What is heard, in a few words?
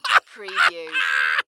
Laugh Hysterical Male; Sound Effects Bible